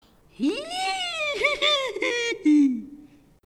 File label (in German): hexen lachen